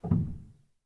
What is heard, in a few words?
rel, Grand Piano; bitKlavier Grand; Piano Bar